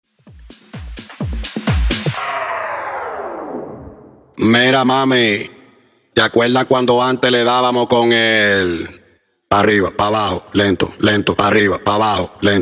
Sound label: Tech House Latin 128 BPM